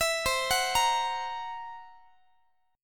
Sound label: C 7th Flat 5th C down Guitar; C7b5 arpeggiated; x,x,19,22,22,21